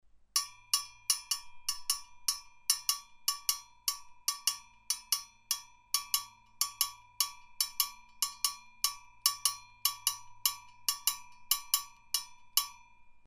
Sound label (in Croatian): zvono